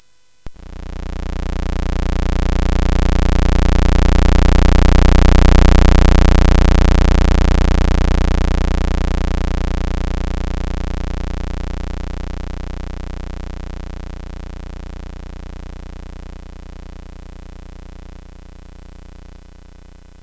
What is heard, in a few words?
Space Gun